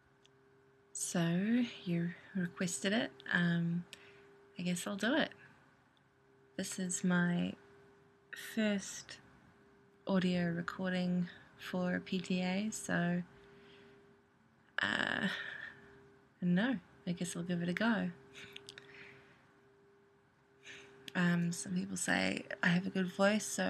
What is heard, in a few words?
Healing Song